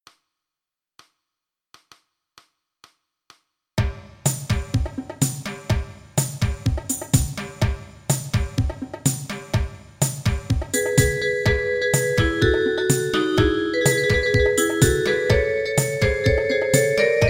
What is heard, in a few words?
Ballroom Medley Quickstep; backing track